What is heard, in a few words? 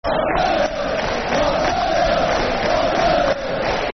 Football Chant - Aston Villa; Martin O'Neill; Aston Villa Avfc